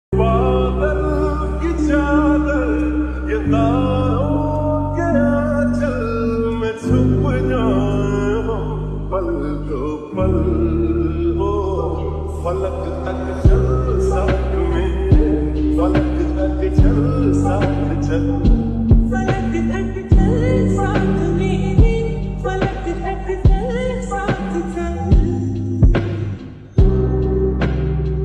ringtone lofi ringtone romantic song ringtone

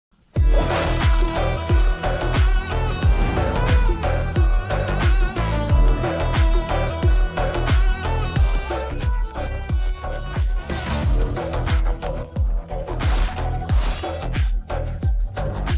trailer bgm ringtone; Devara

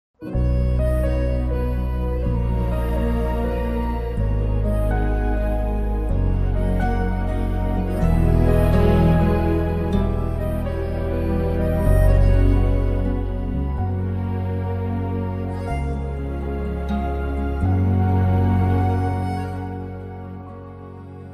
south indian ringtone bgm ringtone; fidaa movie

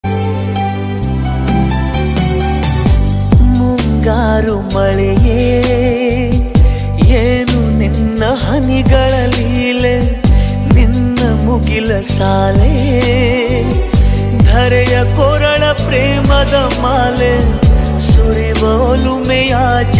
song kannada ringtones

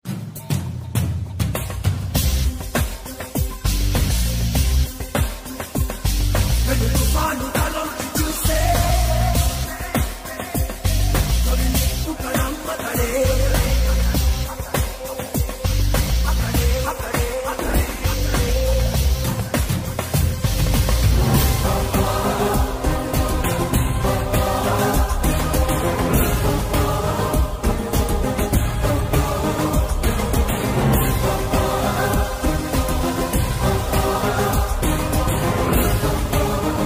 bgm ringtone intense bgm; athadu

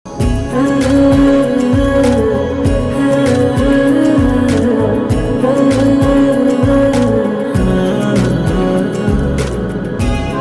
drama background music; ghaata; new drama ringtone

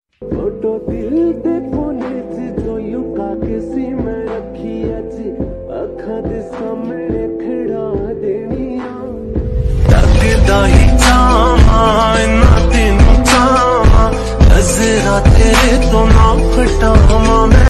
slowed reverb

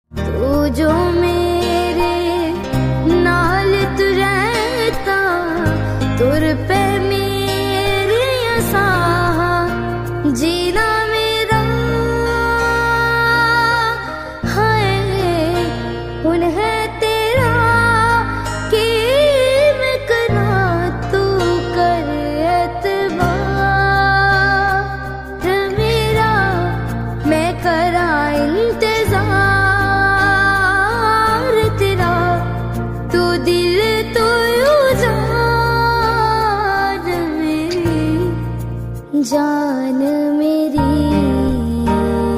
romantic ringtone punjabi; mobile ringtone

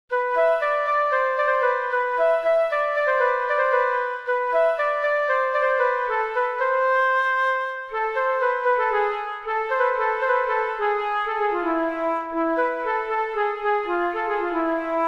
flute bgm piano; mobile piano cover; kantara; rishab shetty

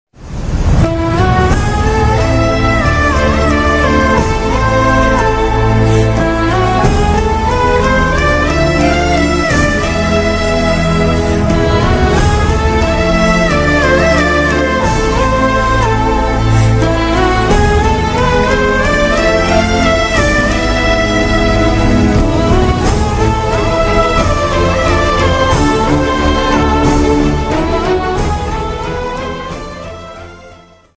sad bgm ringtone; teddy

